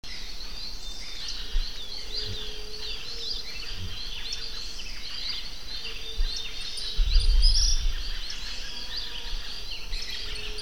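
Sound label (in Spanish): suirirí real, cantos, aves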